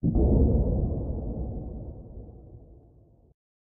Blast

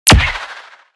Portal Gun

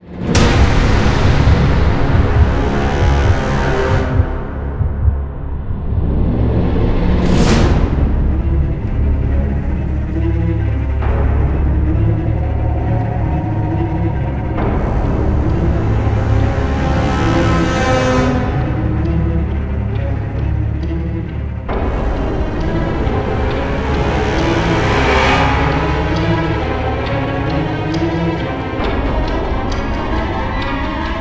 Last Stand Music; Zombie

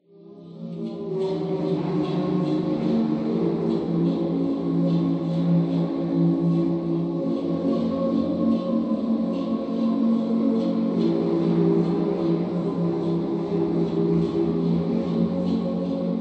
Jazz

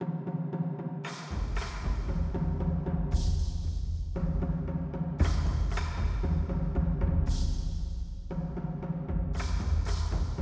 Dungeon Ogre Cave; Pillars of Eternity; Part 1 Dungeon Ogre Cave

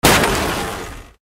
Impact

